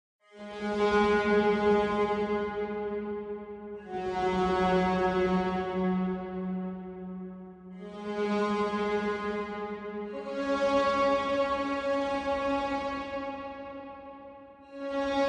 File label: Distorted Orchestra